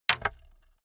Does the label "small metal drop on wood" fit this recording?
Yes